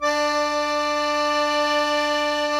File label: KEY, Cajun Acord